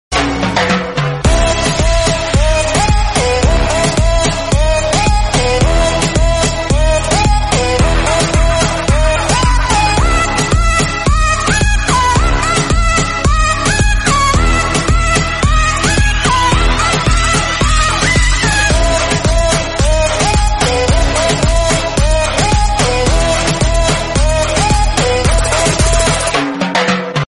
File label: Korean Pop Instrumental Music